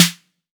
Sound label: 00s snappy snare single hit f key; Duration 0:01; Samplerate 44100 Hz; snare drum samples